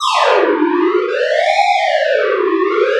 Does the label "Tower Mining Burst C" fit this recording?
No